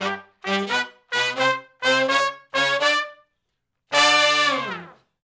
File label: Sh Bls Climb Up to V (G) uni, Horncraft for R&B; Sections; Shf Blus Climb Up to V